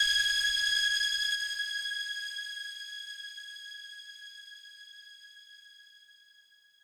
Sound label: lead